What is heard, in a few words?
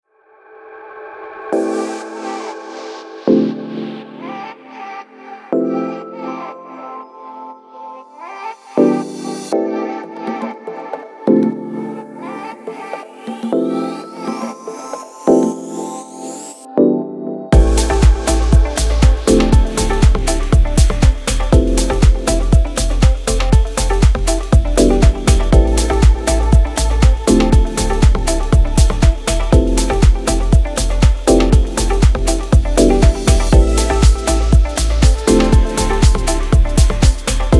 House Music